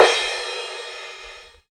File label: roomy crash cymbal sample c key, Royality free crash cymbal one shot tuned to the C note